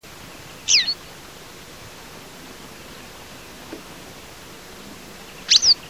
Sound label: motacilla flava